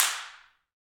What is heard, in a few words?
CLP HNDCL, Handclaps